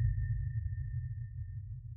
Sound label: sonar Tail Suit Far Beacon Directional; Barotrauma; Real Sonar